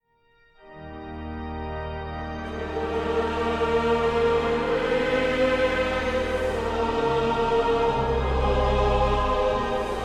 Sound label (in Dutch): Kerstsamenzang; Lofzang Simeon